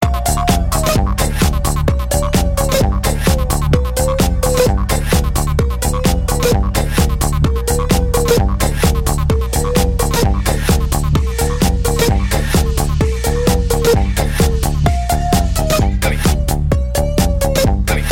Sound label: Electronica